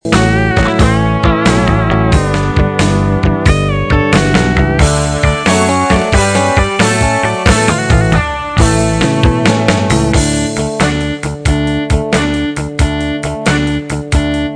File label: Open String Blues